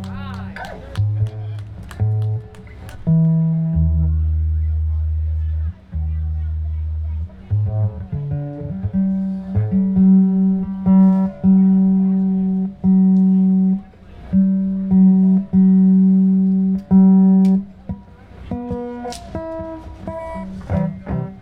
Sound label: tuning